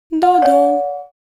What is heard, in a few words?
jingle